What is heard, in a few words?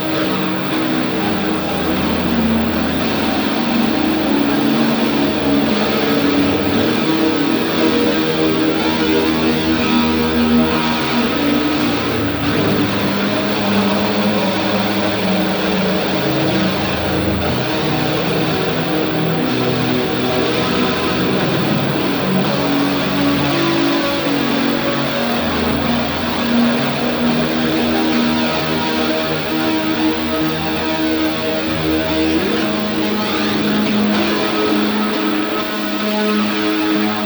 Guitare Hi; GAUGE